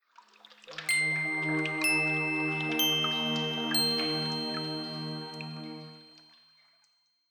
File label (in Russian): vodoperenos materiala v visokih gorah